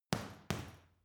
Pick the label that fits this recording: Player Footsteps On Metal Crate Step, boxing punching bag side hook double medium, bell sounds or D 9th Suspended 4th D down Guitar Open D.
boxing punching bag side hook double medium